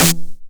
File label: 909 SD Tape Sat